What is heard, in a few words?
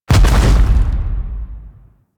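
Shockwave Ground Impact; Enemies; Abilities; Warframe; Corpus; Arachnoid Camper Terra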